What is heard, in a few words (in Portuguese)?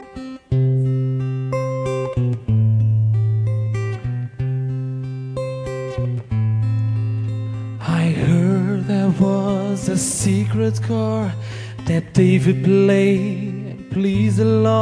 Hallelujah Ao Vivo